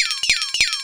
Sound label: FLEXATO C5; Big Fish Audio; Synth City